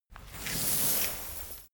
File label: chain mail pick up